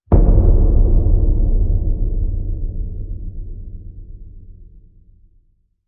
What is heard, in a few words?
Hit Low Hollow Ground